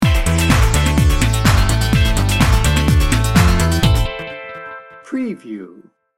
corporate technology edm sting bumper

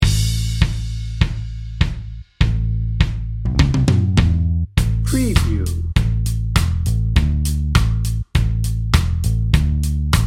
texas bbq dnb

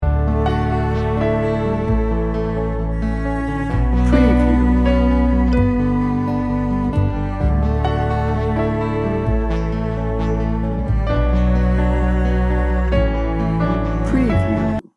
hopeful emotional cinematic loop

